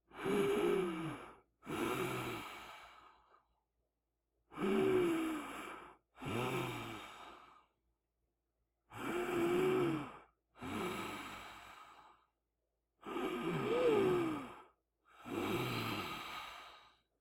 Labored Breathing Sleeping

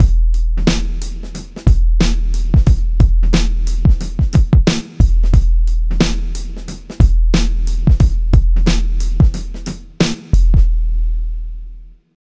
Loop

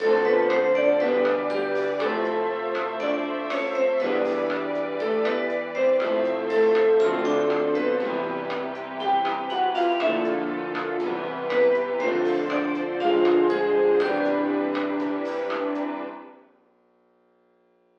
Elevator Music

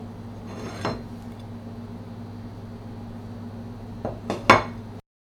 Plate

